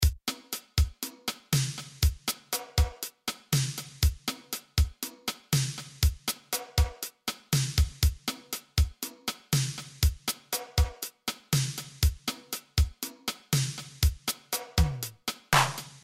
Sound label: linn 9000